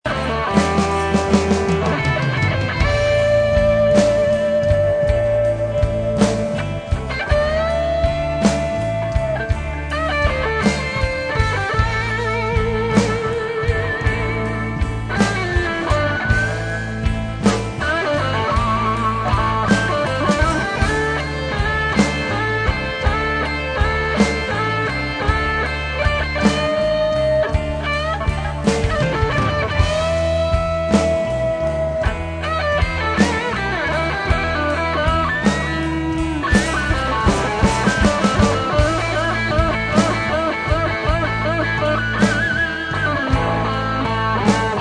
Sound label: guitar solo